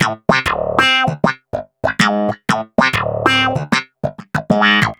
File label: Wah Snap in D Funky, Snap Bass; Basses